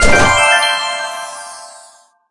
perfect ribbon; Pastel meringue cookie

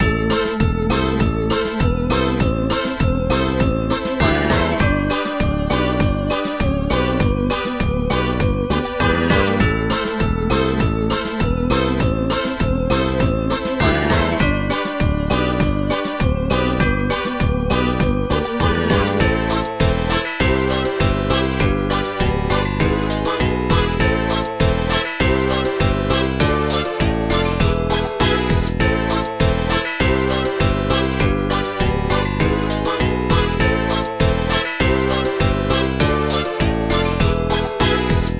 Bgm lobby; land05

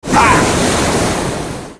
Ship Comming; Salt

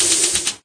slide; cookie run

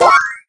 cookie jump; Pastel meringue

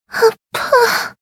hit; Cookie Run

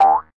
jump; Cookie Run

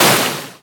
skill sea explosion; agar agar

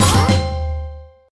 Treasure Fail; Cookie Run

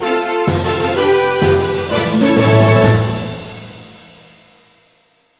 Pvp Jingle Win

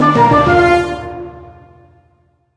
Jingle; TrophyMode

